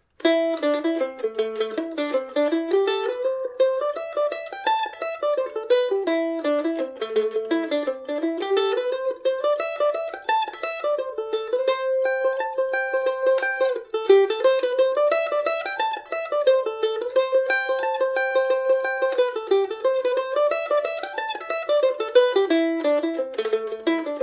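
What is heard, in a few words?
C major, Irish mandolin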